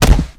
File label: vort foot